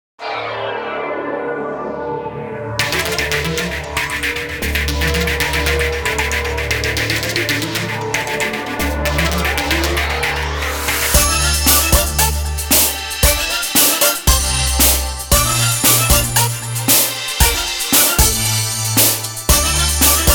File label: chromaphone 3; wicked symbols; daniel stawczyk